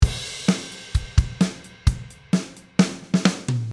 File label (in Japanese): drumフィル